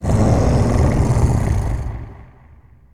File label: roar; Woolley